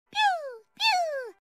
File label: pew pew; star butterfly